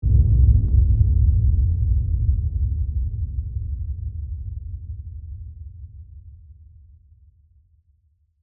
Horror Hit LFE, Horror, Hit, Lfe, Rumble, Accent, Transition; Production Element, Imaging Element; Scary Tone; BlastWave